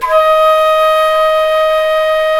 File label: FLT FL AC, C Flutes; Roland LCDP04 Orchestral Winds; 90 s Sample CDs; C Flutes 3-8